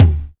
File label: mid tom drum one shot d sharp key; Bitdepth 24; Channels Mono